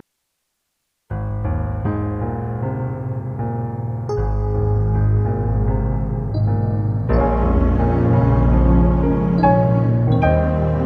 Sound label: Accompaniment only in A flat